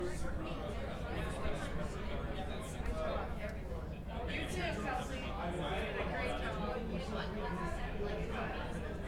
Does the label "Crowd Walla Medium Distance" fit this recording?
Yes